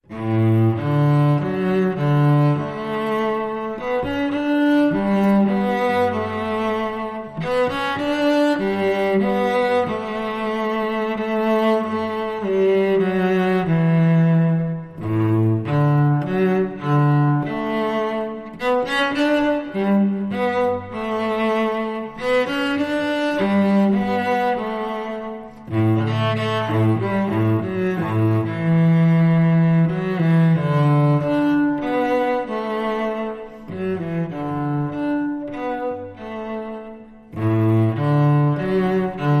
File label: Cello